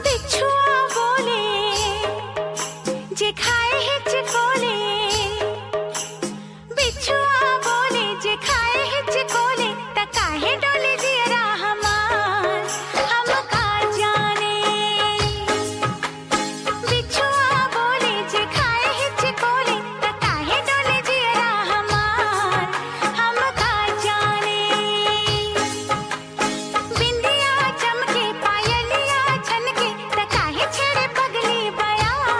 Old Hindi Song Ringtone

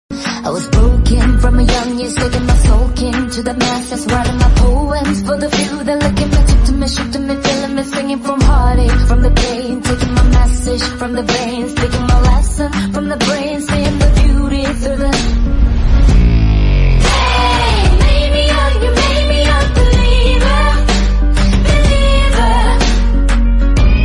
Female Version Ringtone

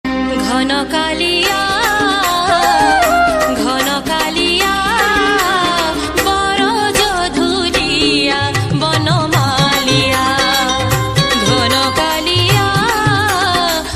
Odia Jagannath Bhajan Ringtone

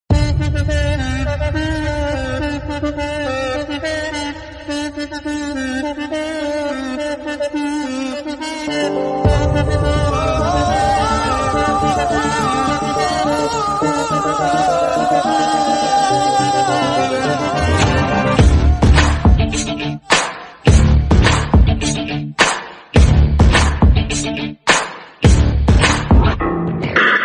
Theme; Janatha Garage

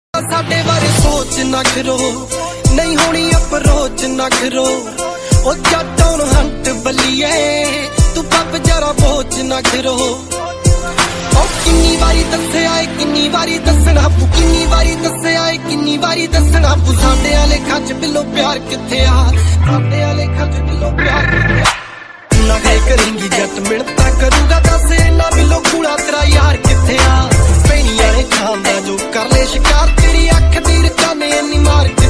Punjabi Ringtone

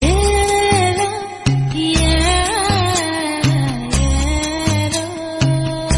Instruemental Ringtone; Saravanan Meenakshi

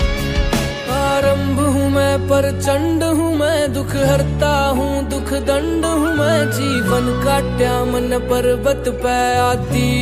Bhakti Song

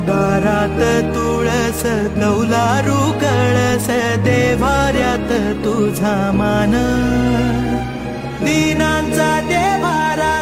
Marathi Song Ringtone

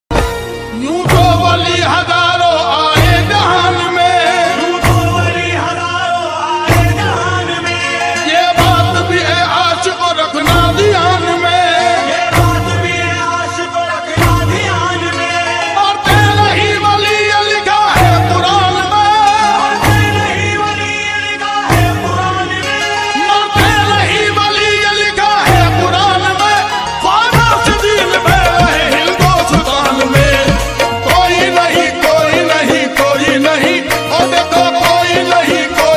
Naat Ringtone

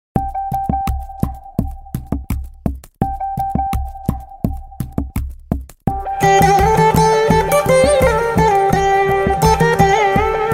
BGM Ringtone; Seema Raja

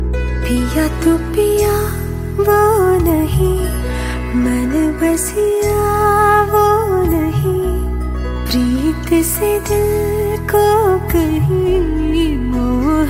Female Song Ringtone

